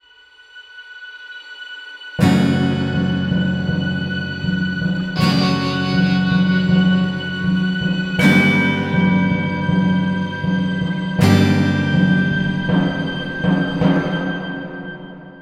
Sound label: Stinger City Enter